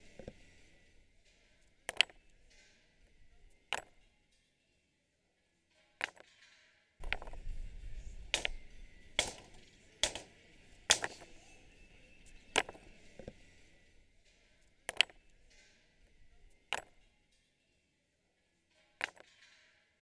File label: Rocks Hitting Other Rocks